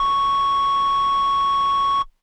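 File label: Air Bass Kawaii C#1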